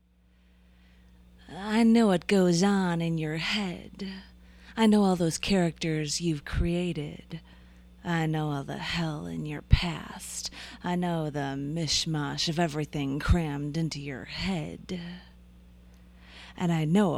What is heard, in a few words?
tribal scream; 4track